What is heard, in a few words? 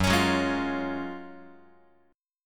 F sharp Major 7th Suspended 2nd, F sharp, down, Guitar; strummed; JGuitar